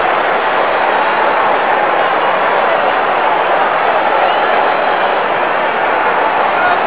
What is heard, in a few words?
bigbed